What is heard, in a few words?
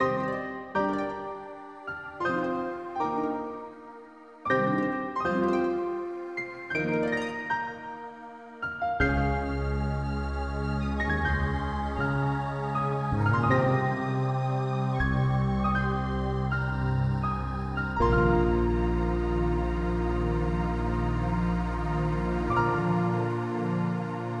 Game Over; everblue 2